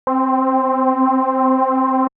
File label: Synth Subtractive Cut